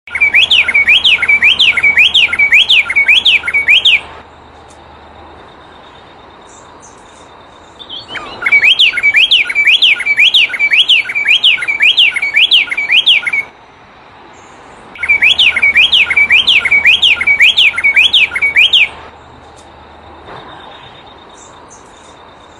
canto do garrinchao

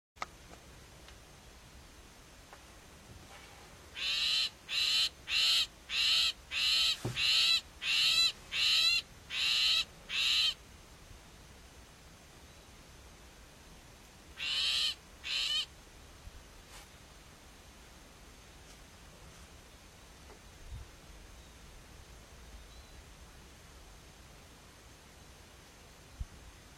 canto do picanco real

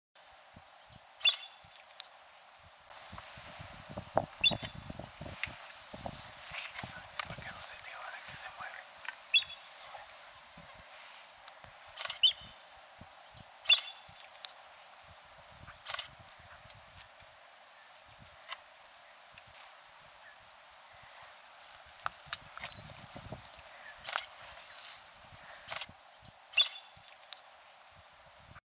canto da avoceta americana

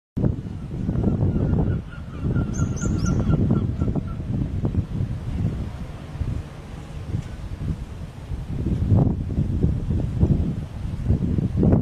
canto da petinha maritima

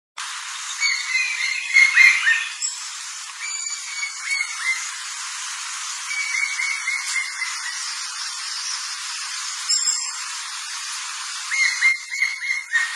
Canto do pica pau amarelo

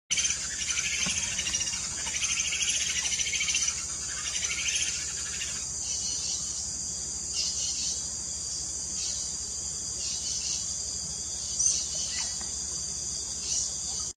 Canto da ariramba castanha

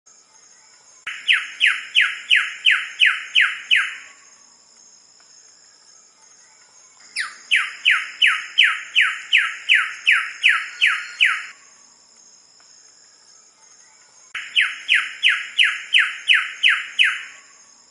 canto da ave do paraiso de wilson

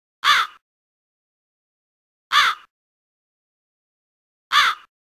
corvo

